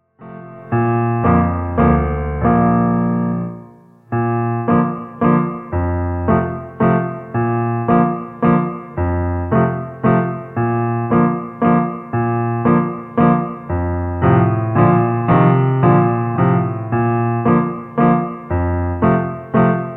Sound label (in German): walzer playalong